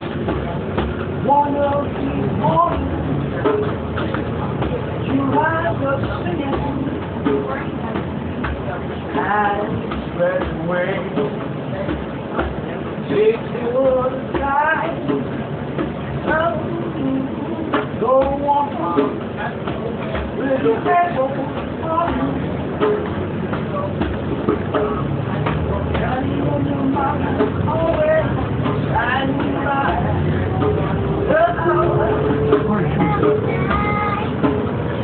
gentle mini concert on the bus man on djembe and singing a verse; on the 97 bus; little girl